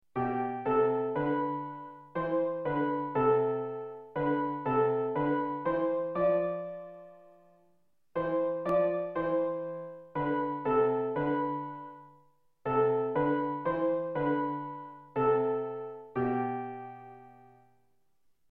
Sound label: six unison melodies; simetria; bartok